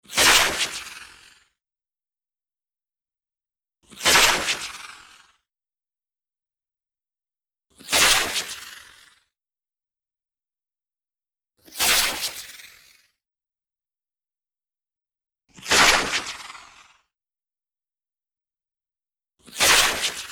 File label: lazer pitches